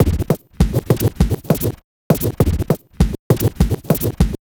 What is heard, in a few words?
Vinyl Scratch Kick